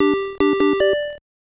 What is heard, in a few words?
New High Score; California Games; NES